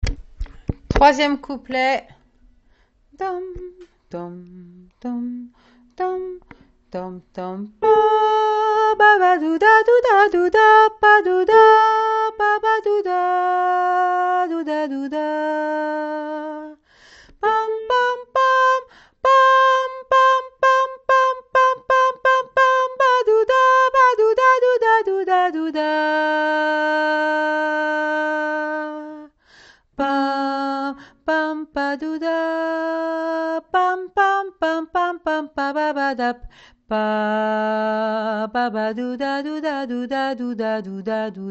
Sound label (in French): sop et alto